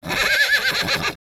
horse cry

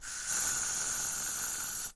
spray

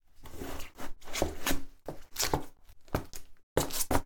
shoes wear

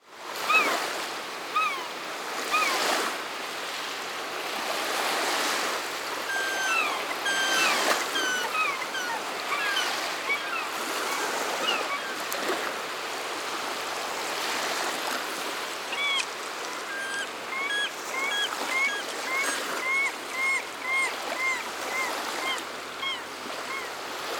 beach seagull

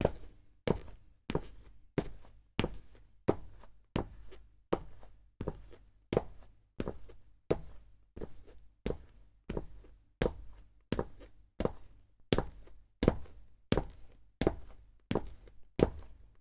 walk asphalt

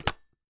rice cooker close

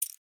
decision, button

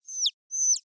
chick cry

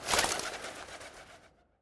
pigeon take off

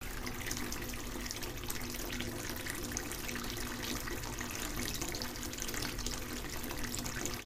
bath add water

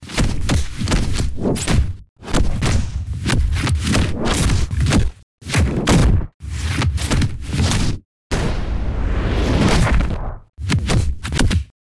fighting scene

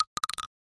shotgun shell

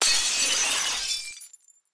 glass break

